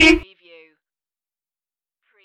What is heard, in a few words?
funny horn